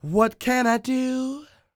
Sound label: croon, Vocal Planet Blues Soul; Spectrasonics